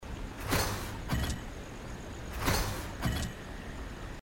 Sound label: sharp shot; hyper light drifter